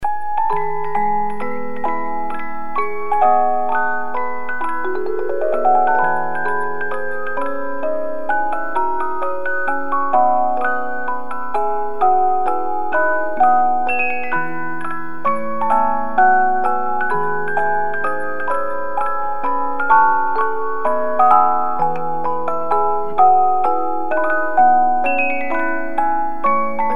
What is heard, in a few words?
Minuet in G Major, music box 50-Note